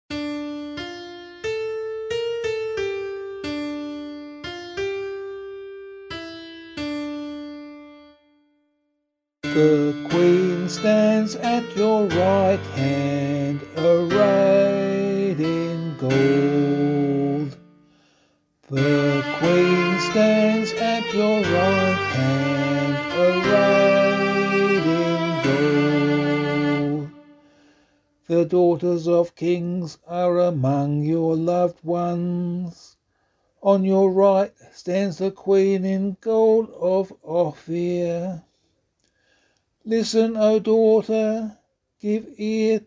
Psalm, vocal

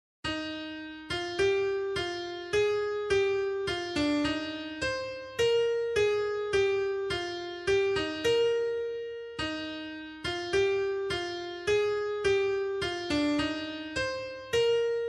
AUSTRIAN HYMN

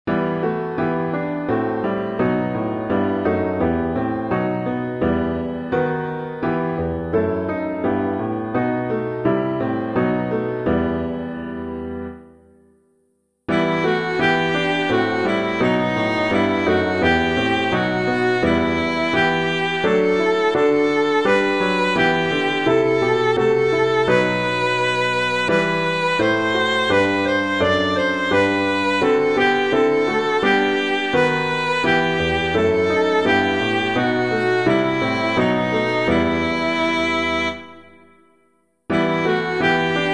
O God Creator of Us All, piano

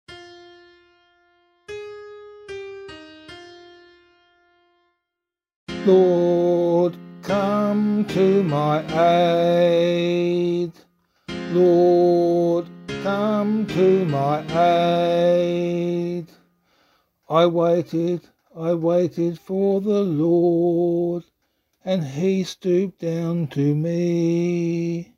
Psalm C vocal

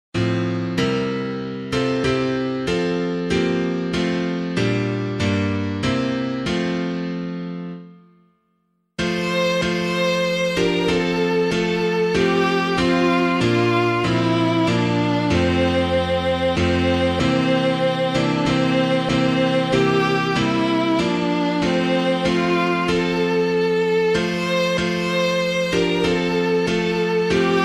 Away in a Manger No Crib for a Bed, piano; MUELLER

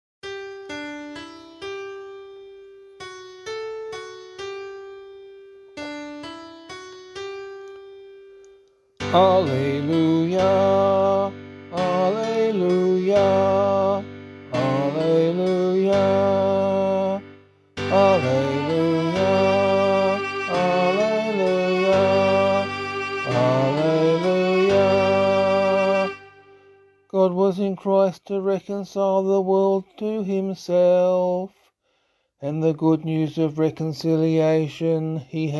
Gospel, vocal

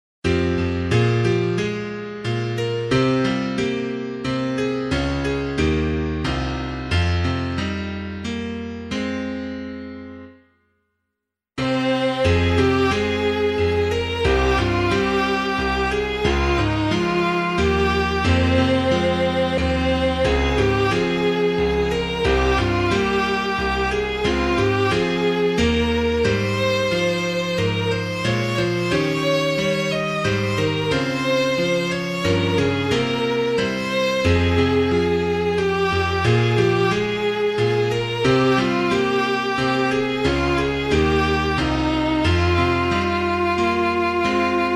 If Christ Had Not Been Raised from Death, piano

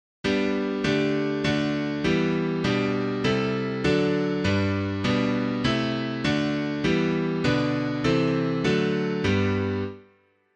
piano; Diverse in Culture Nation Race; Duck; TALLIS' CANON

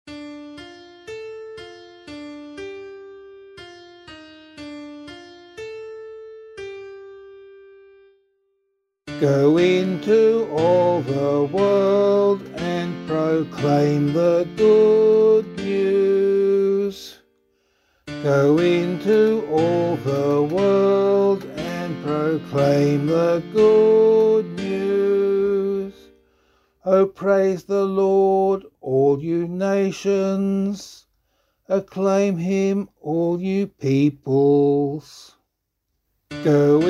Psalm C vocal

